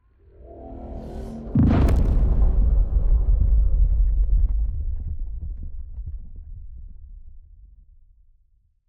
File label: ship colossus move; Stellaris; shroud; ships